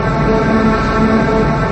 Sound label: snowspeeder engine; lego star wars